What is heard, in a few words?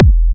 Kick; WAProd Free Kick Samples 2018; WA Kick Pack 1